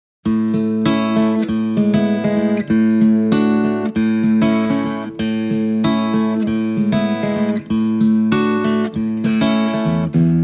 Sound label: martin lx1e little martin electro acoustic; gear4music demo